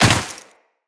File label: Land Snow, Footsteps; Characters; Tenno